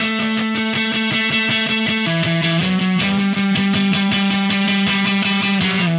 Happy Pub Guitar

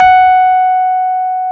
F#4, Electric Pianos; Wizoo

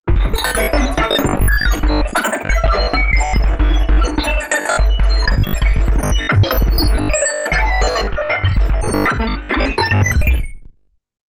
insane glitch

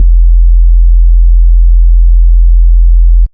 kick, Big Beat Samples

